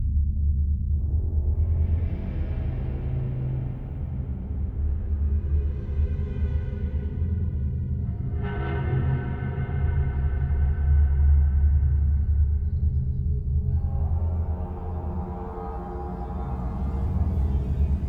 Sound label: Atmos; Return to Castle Wolfenstein Operation Resurrection; ps2